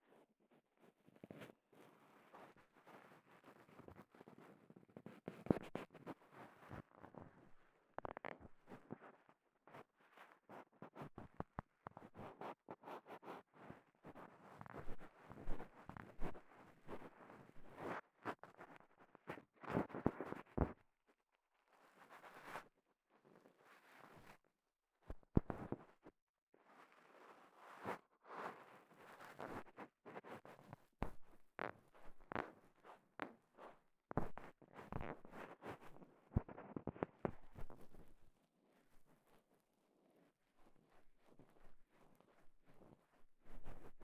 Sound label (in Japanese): 綿棒 奥 L, 耳かき; KU100